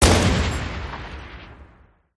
lancerturret firestop enemy; gow

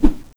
zombie whoosh; doom3

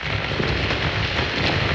dirt skid, vehicles

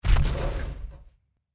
walk, power armor; clothing system

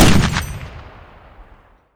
50 cal single; bms

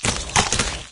evade right novoc, maggot; doom3

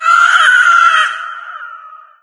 death, cherub; doom3

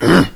zombie attack; doom3

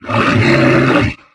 vox attack

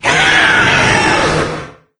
death, wraith; doom3

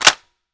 foley barrel close; weapons

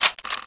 clip out; tfa cso; negev

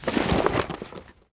gasmask off

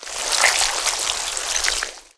worm strafe, giant worm

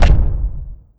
step; cyberdemon

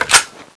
clip out; tfa cso

